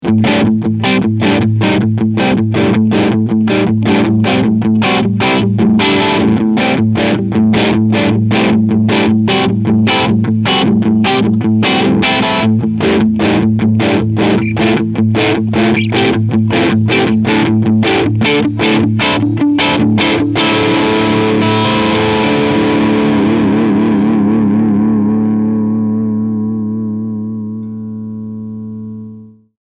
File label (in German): Crunchsound beide PUs